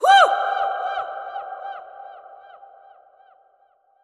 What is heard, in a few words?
Vocal Fury Queen Shout